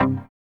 HOUSE, House Dance

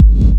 KICK PRO -R, Disco House

